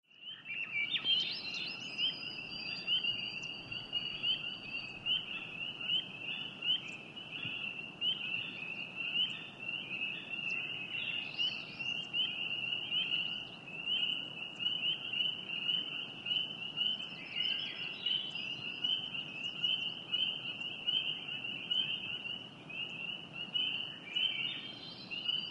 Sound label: Frogs Peepers Night F